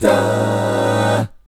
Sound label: GUY CHORDS, Vocal Planet Jazz; ILIO; Vocal Planet VOL-3; Jazz & FX